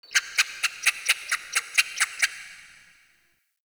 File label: Critter Random; Warframe; Ambience; Nokko Colony